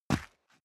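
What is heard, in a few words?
step boots single concrete grit single stair up, footsteps; schritte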